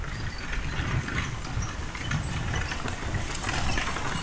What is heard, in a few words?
Horse Drawn Wagon By; Electa Quinney